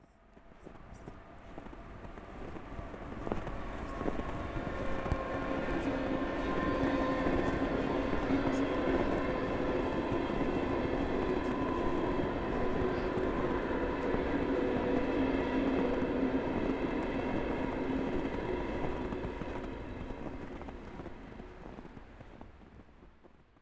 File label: train slicing